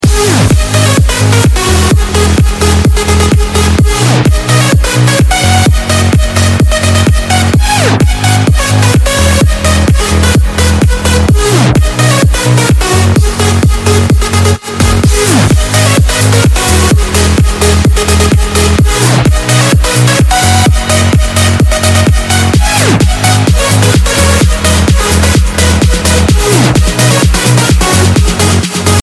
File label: party dance electro phone tone ringtone